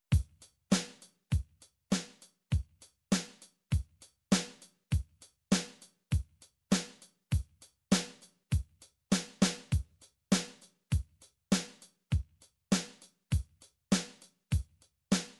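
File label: midi drums simple 8ths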